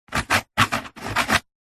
office pen biro ball point sign signature on cardboard piece